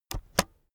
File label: keyboard external space press slow